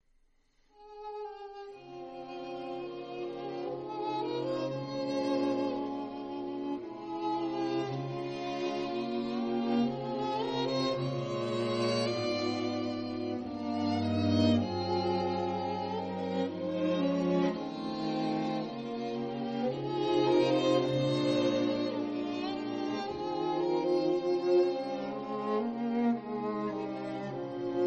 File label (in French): Quatuor